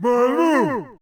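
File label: yell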